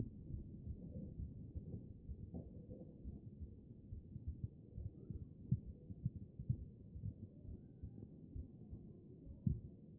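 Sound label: anterior chest, position 4; B MODE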